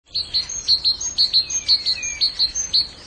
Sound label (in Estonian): Rasvatihane häälitsus; summer; Which bird makes this sound?